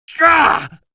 pain, male

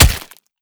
melee hit body; arccw

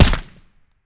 m4a1 silencer; arccw go

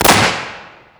shotgun fire distant; hl2 weapon; annabelle

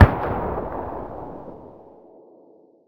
sniper fire distant; hl1 weapon

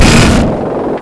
fire, xencannon; hl1 npc

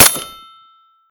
malfunction; arccw

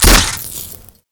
taser shoot; arccw go

